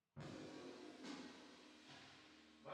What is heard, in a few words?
Drums Verb